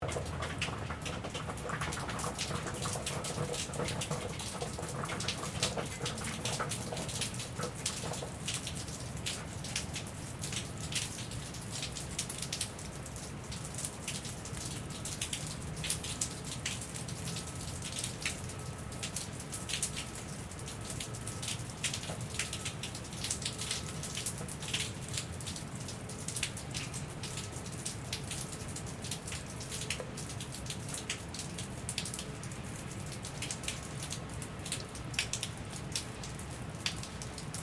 RAIN WATER DRIPPING AFTER RAIN STEREO; Weather

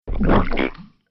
Muddy Puddle Splat; Slimy Sound Effects; sound design

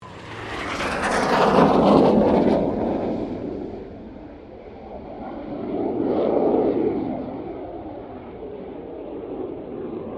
AIRCRAFT FA-18 HORNET PASS

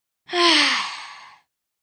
Sigh Relief, 1 child, sigh of relief

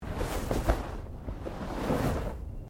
Body movement Clothing Foley

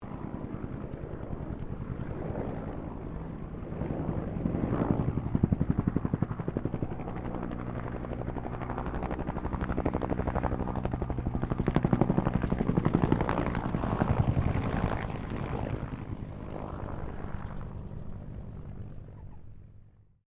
HELICOPTER 1967 BELL UH1 IROQUIOS PASS

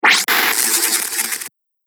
Static; Ghost Sound Effects; Horror Sound Effect

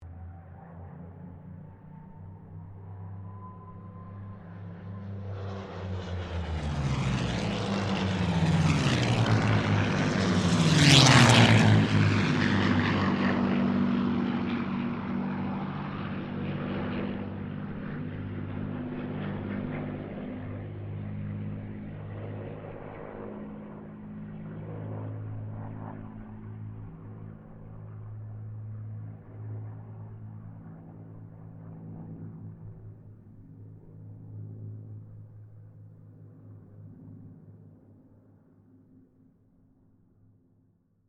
Spitfire Mustang Kittyhawk pass; Mixed aircraft squadrons; Airplanes; Vehicles

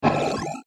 High Speed Alien Whoosh; Whoosh Sound Effects; Andrew Fleming

